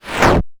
Sound Object Fall; arcade-canvas; ninja-runner

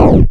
Sound Land Heavy; arcade-canvas; ninja-runner

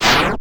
Sound Missile; arcade-canvas; ninja-runner

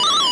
sound warning; arcade-canvas; defender

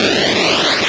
sound baiter warning; arcade-canvas; defender

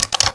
Sound Open Door; arcade-canvas; ninja-runner

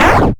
Sound Enemy Death; arcade-canvas; ninja-runner

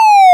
Sound Fall Loud; arcade-canvas; ninja-runner

